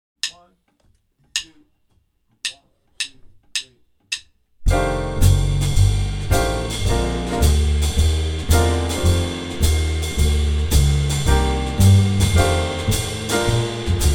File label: Blues in F playalong; TMEA; Jazz Mid-State F Blues Track